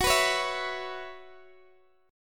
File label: F sharp 7th Suspended 4th, Guitar, Open D